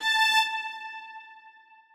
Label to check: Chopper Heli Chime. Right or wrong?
Wrong